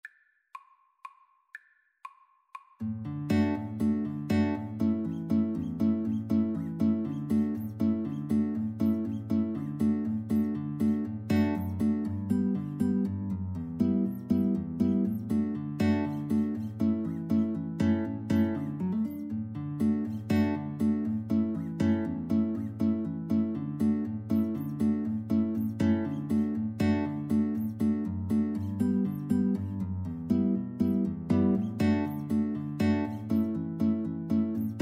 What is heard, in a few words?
storpolska violin guitar; Violin-Guitar Duet; Bingsjo storpolska